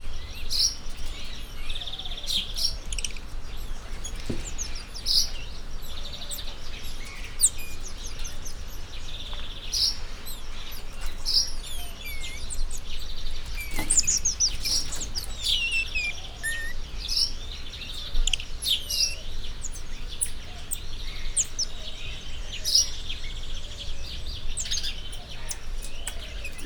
szakértő füleknek, lisztmajmocska vagy gyapjasfejű tamarin; jaszberenyi zoo 2015

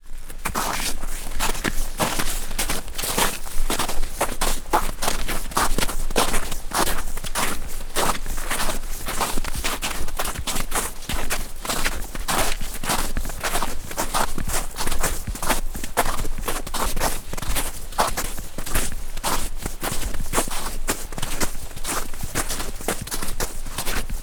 egymás mellett, hó gyalogolás; Visegrádi-hegység

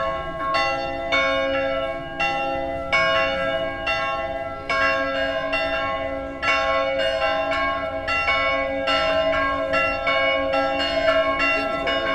esti harangozás

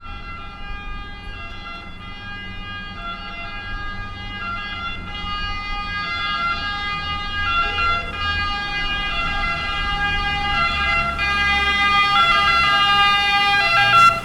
jobbrol balra szirenazva, szirenazo vizi jarmu; velence; olaszorszag 2014

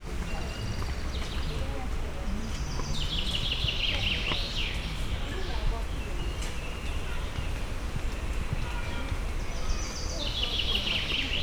hosszabb nyavogas, puma; szegedi zoo 2011

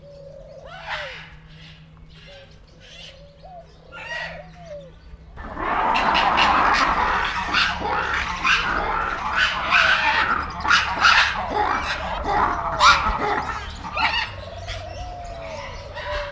perpatvar, vari; állathangok; nyíregyházi zoo 2010

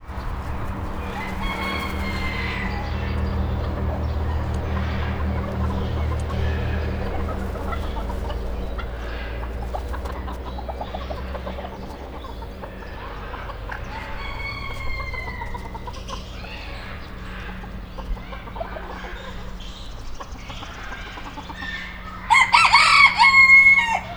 színes páva széttárt tollát rezegteti; állathangok; nyíregyházi zoo 2010

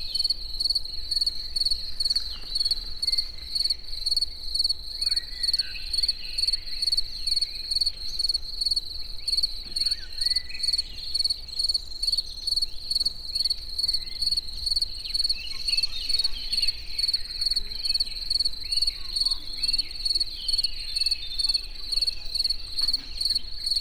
estefelé, tücsök; allathangok; termeszetben; egerturistahaz

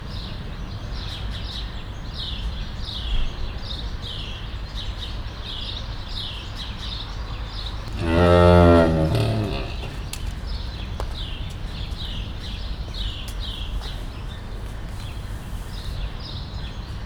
egyet bőg, vöröshátú bivaly; debreceni zoo 2019

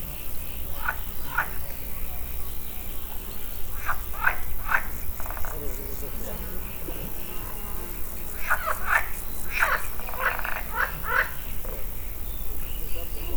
tavi béka; Tiszababolna; termeszetben

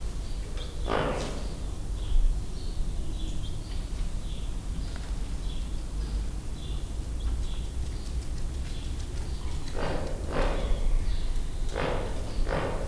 madarhazban, torpe flamingo; debreceni zoo 2012; marantz puska

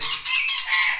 az első nek megszólaló, lorifélék; debreceni zoo 2012

